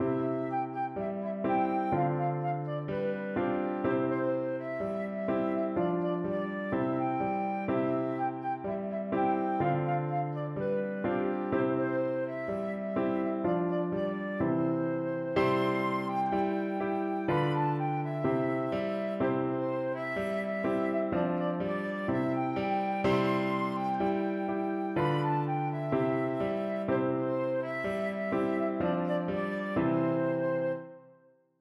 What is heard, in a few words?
banana man, flute